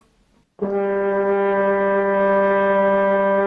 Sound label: French Horn Note